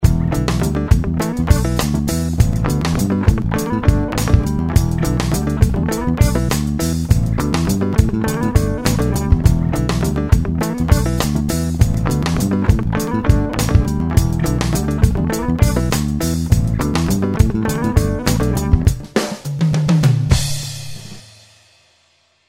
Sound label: FUNK